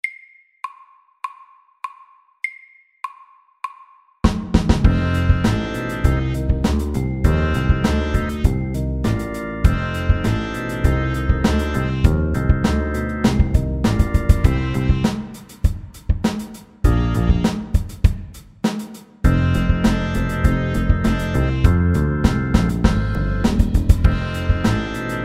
8 bar blues SAKTE

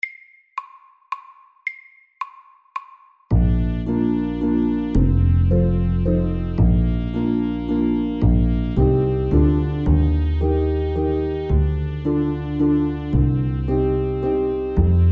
Sommervals FAST 110

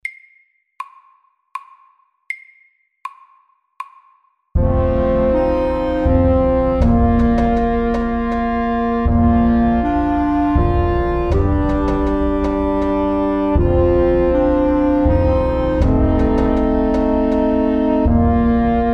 Vikingdans MEDIUM 80

